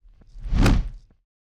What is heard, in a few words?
fighting swoosh kick; soundscrate